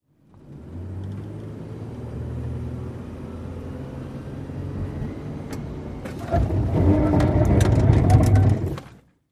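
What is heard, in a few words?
SUV Truck 180 Rev Skid Interior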